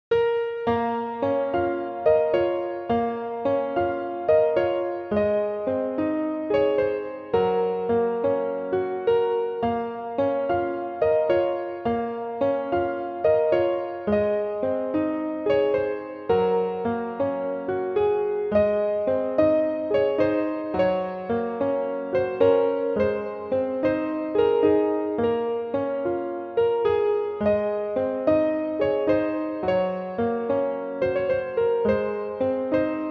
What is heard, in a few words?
Piano